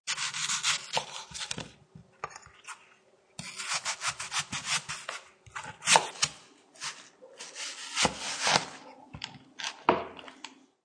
Slicing a crisp apple with sharp knife on wooden cutting board; Chopping (Sound Effects:Objects)